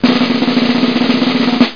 Lock Chime Drumroll

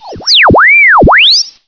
Radio Tuner; LockChime

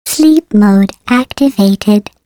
Lock Chime Portal Sleep Mode

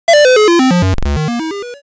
Lock Chime Video Game Opener

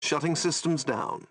Lock Chime, shut down; jarvis

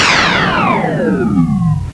Lock Chime Shut Off

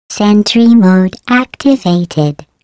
Lock Chime Portal Sentry Mode

